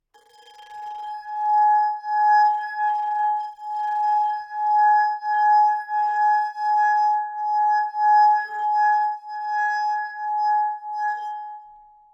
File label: Crystal, Glass, Tall Narrow, Tone, Rubbing, Musical; Raconteur Sound; Crystal Glasses- Tones and Drones